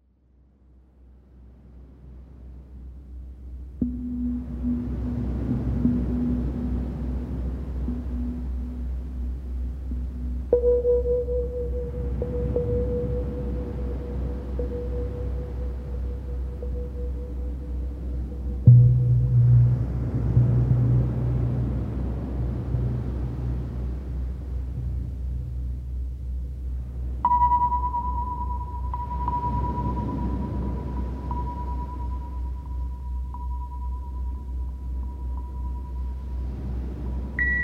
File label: Meditation With Bells